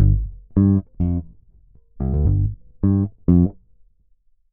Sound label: BASS